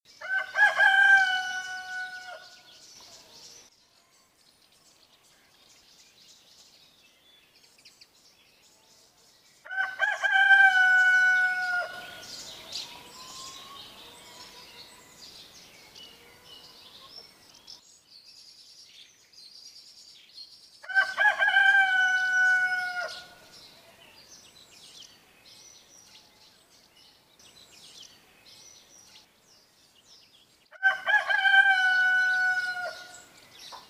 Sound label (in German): hahn kikeriki gockel